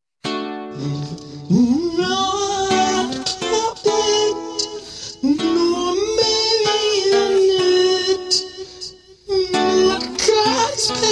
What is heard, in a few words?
no strings attached